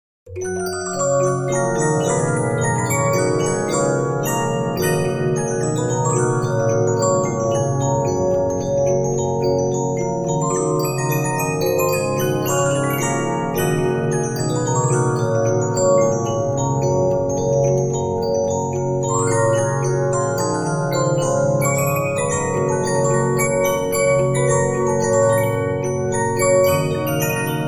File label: Regina, 15inch disc musicbox